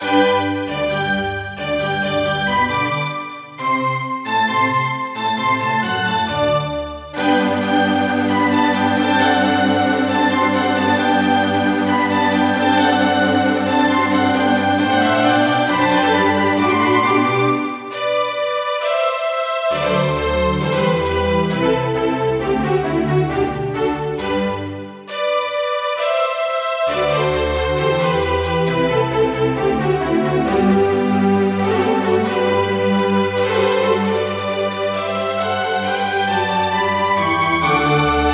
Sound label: Yamaha MU5; Mozart - Eine Kleine Nachtmusik partial; classical